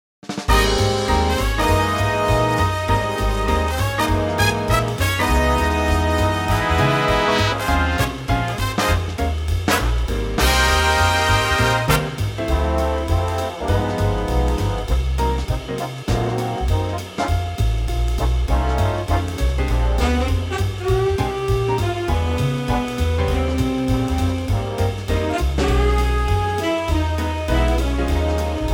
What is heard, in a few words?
Ab Big Band